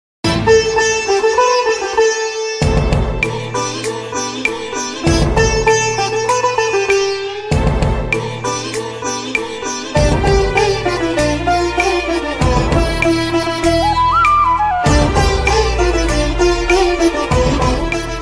kannada love bgm ringtone; ravichandran

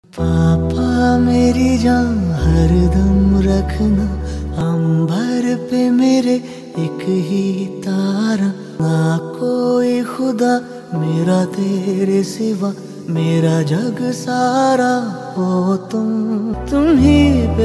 song ringtone; animal; ranvir kapoor; rashmika

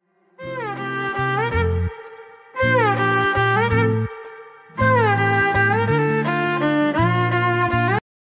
love bgm; nava manmadhudu movie

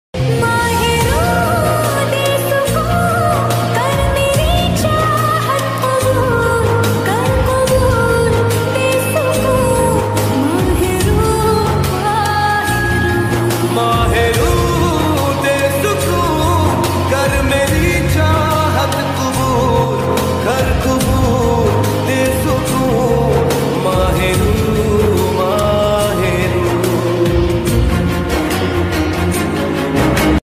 hindi ringtone

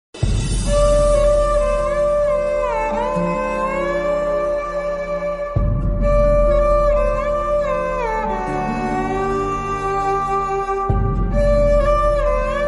background ringtone, bgm ringtone remix

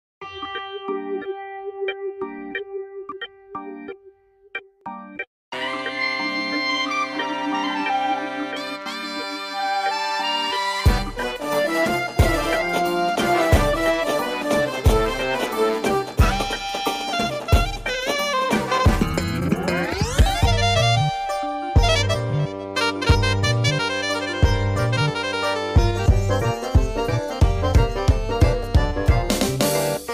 marriage bgm song; pushpaka vimanam; anand deverakonda

